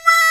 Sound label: Trpts; Club-50 - Foundations Roland; Trumpets; Wa Trpts